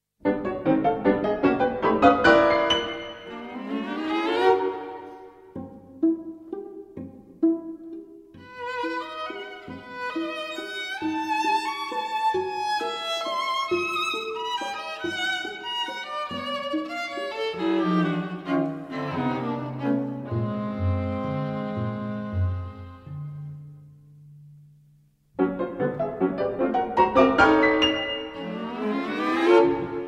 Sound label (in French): Quatuor pour piano, violon, alto, violoncelle, Allegro